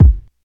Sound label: rich low end bass drum single hit a sharp key, Royality free kickdrum tuned to the A#